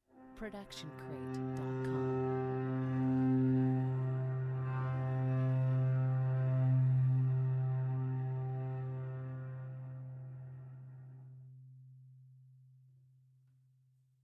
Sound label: Cello Airy Scratch Harmonics; SoundsCrate